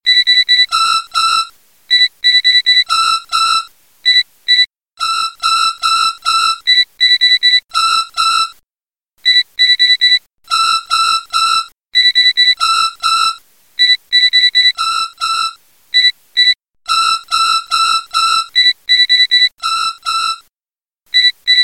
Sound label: morning alarm